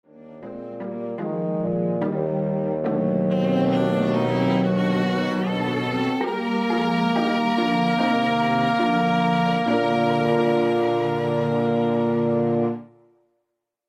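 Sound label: Live Orchestra Musical Theater Backing Tracks; Aztec Showtrax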